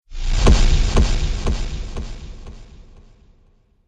Trailer Hit Distorted Echoes

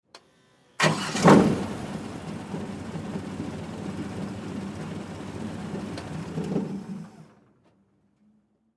Air Boat Rev; Air Boat Start Idle Shut Off; Air Boat Engine; Transportation Watercraft; Boat Sound Effects; Blastwave

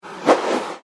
Warble By, Scifi, Warble, By, Science, Fiction; BlastWave

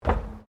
FOOTSTEPS WOMENS LOW HEELS ESCALATOR STEP; Footstep Foley; Sound Effects:Human

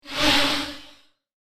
Alien Droid Swoop Burst, robot

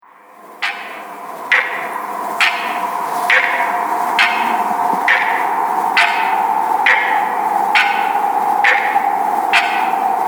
The Haunted Clock; Clock Sound Effects; Horror

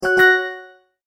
multimedia tone start; Sound Design

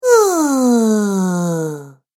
Cartoon Girl "Oooo"; Cartoon Voice Sound Effects

